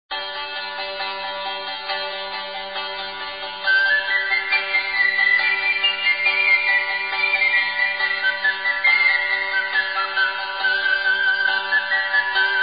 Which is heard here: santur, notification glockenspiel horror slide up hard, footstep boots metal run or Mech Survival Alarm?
santur